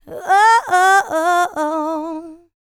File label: licks, vocal, dance; ILIO; Vocal Planet